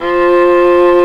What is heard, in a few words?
STR VLA, Viola Solo, marc